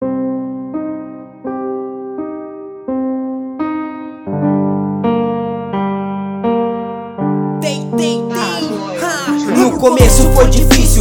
Rap

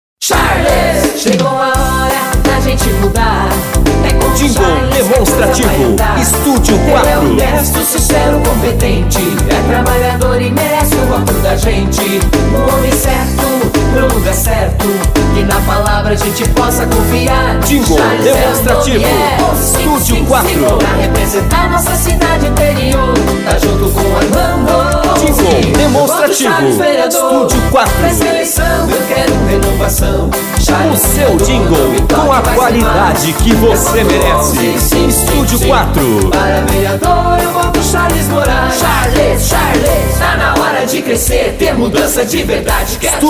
jingle inedito

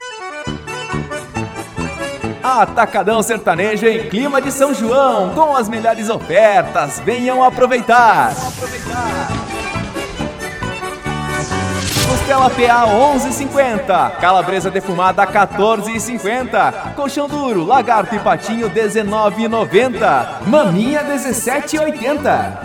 atacadao sertanejo em clima de sao joao

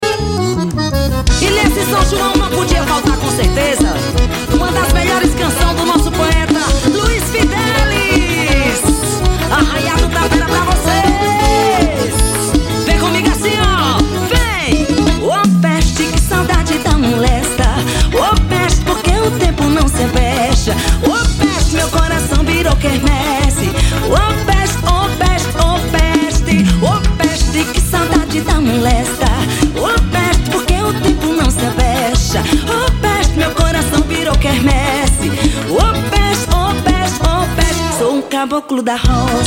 arrasta pe do forro

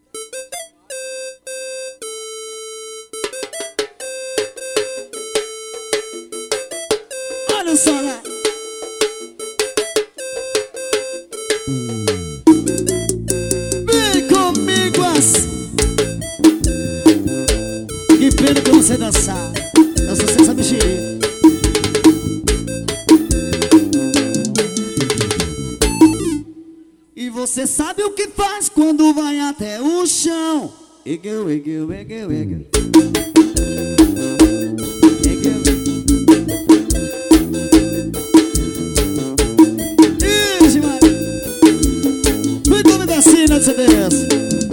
ao vivo medios graves

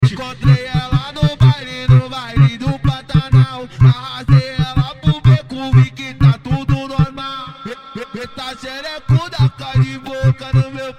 gaita

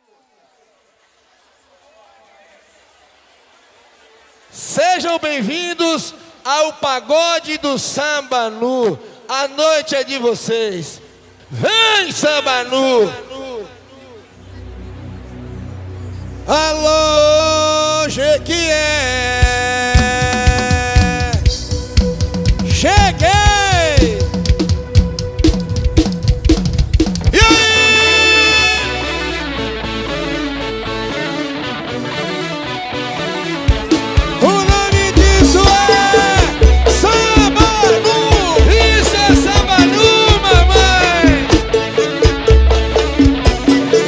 samba introdução

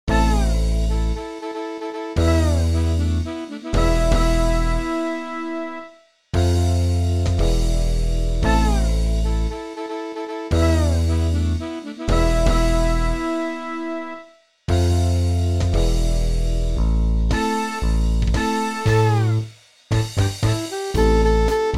orquestrado, Saxofonista

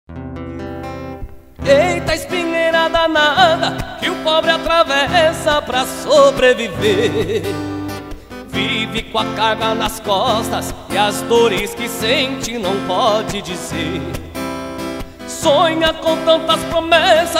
acústico

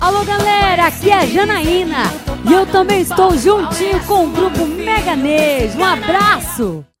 vinheta

